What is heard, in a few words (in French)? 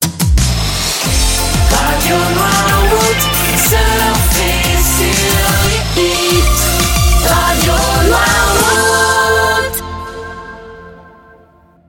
Jingle Chante Soft Pop; RADIO NOIRMOUT